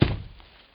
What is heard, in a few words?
Animatronic step